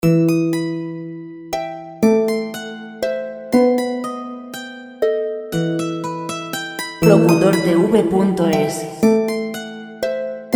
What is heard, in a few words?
90 guitar classic; Música de fondo sin derechos de autor para documentales; Tarrega